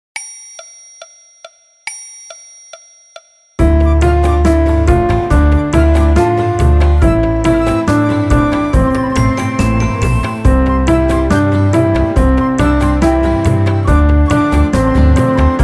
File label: Piano Orch; Mollamamadjan; ملاممد جان